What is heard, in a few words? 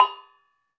Sumatra Gong; Club-50 - Foundations Roland; ExotcMetal